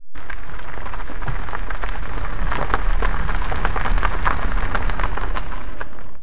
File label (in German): autoroll